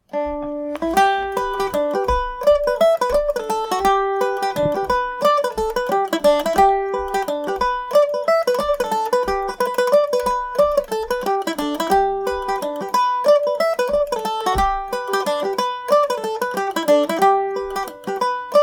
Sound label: md304, Irish mandolin; duke of leinster revisited